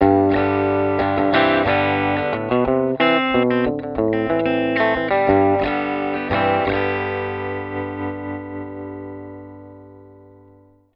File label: LP BRIDGE LICK, humbucker pickup; patent denied humbucker pickup